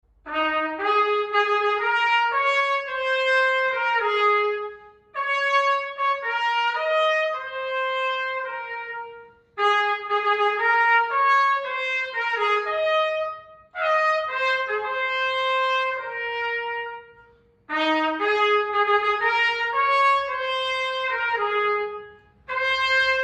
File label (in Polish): hejnal